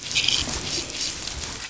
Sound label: landing; son of mactans